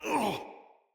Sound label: Pain, Soldier Male; Dendrit